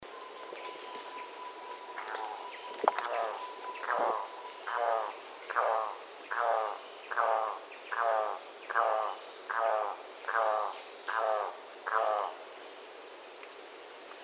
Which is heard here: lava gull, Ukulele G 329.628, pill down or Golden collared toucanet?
Golden collared toucanet